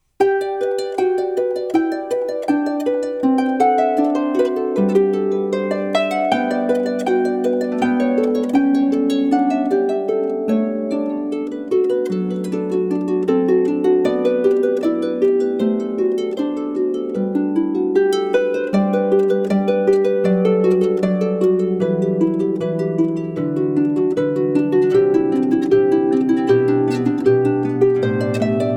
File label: harp samples